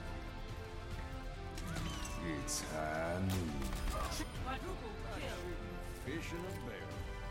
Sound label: ultimate shot; McCree